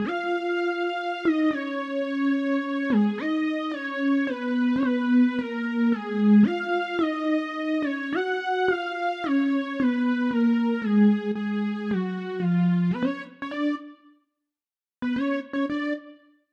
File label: synth intro